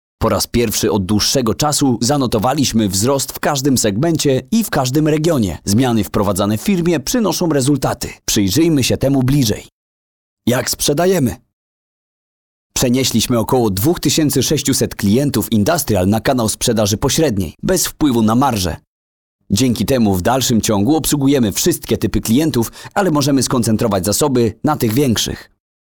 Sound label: corporate movie korporacyjny fast paced synchro